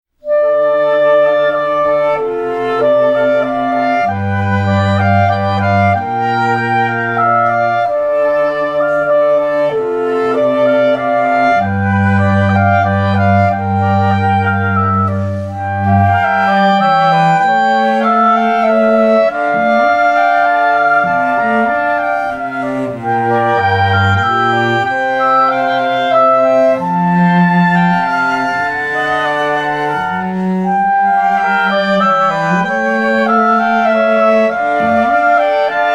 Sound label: Classical Trio